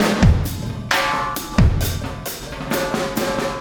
Landing Party Beat, Processed Drumkits

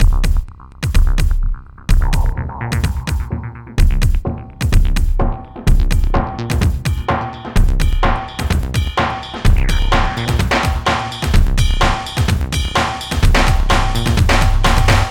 Scrubbed Clean 127 Fmin, hardcore breakbeat; Big Fish Audio

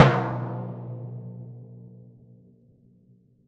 A#2 f, Timpani, forte; PowerFX; Downtown Orchestra; scales

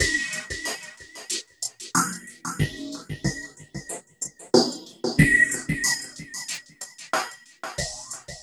CHUG SWEEP; Distored Reality

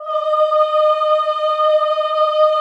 CHO, Aaahh; Sound & Vision - Gigapack I CD 2 (Roland)

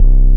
bass, 167 BPM; Ueberschall; Drum & Bass Resonance

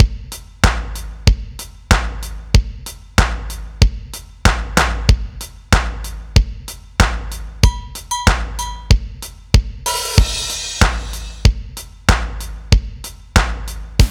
Drum Break; Rondo Brothers; Sing Into The Machine; Sonic Foundry (Sony Creative Software)

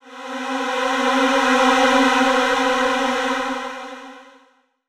Texture 2 - C; 99Sounds; Monosounds; Music Loops; Instrument Oneshots

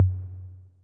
snap, Yamaha Tenori-On Snapkit

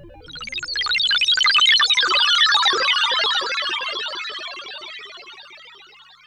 Classic S H, sci-fi

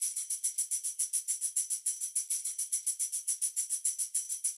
Tamb Proc 110, funk soul Beats; sampled funk soul; 105bpm

